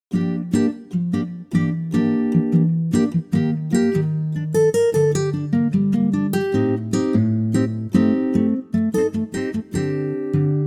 Ac Gtr Gm

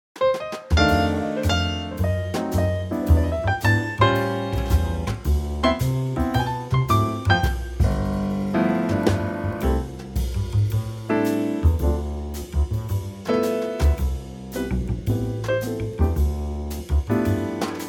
Trio

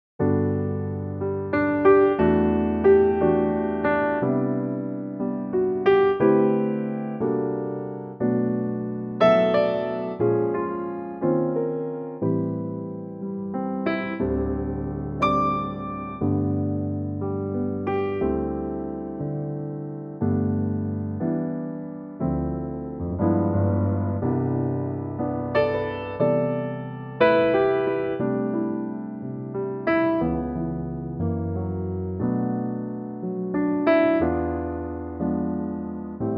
piano G

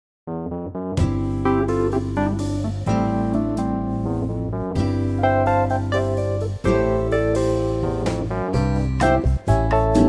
bossa C

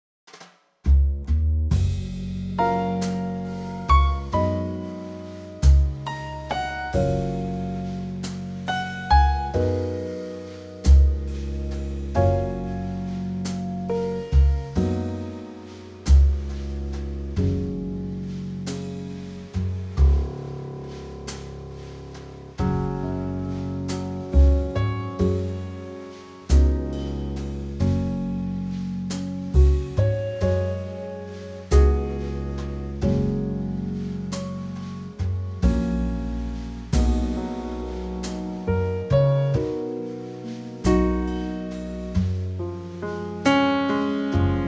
Gb Trio

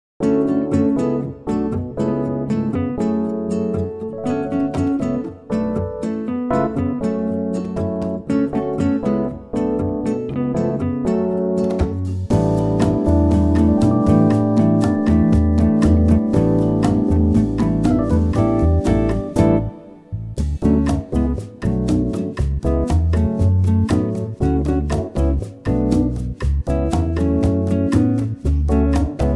September bossa

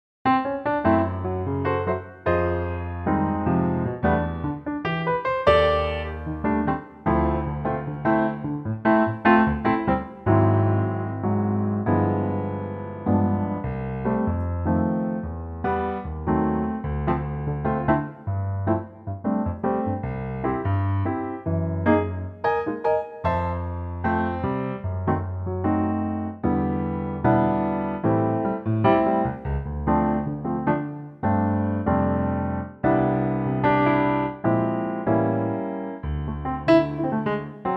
C Piano

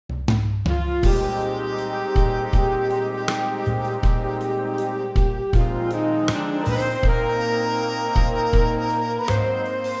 Big Band Eb

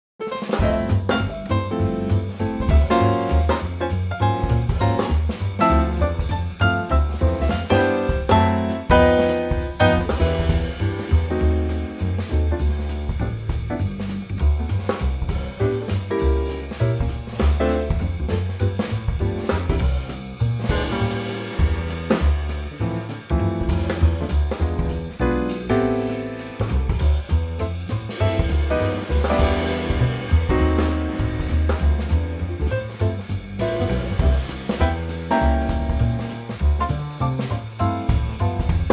Trio jazzville

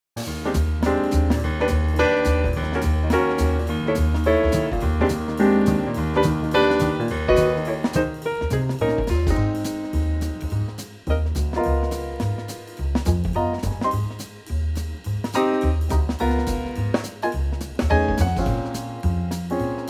Ab Trio

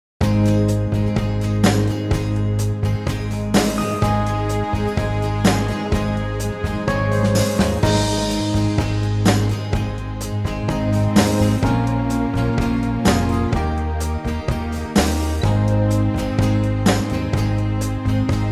Backing Track

